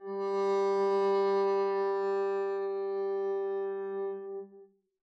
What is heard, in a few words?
arco g3 p down, double bass; dsmolken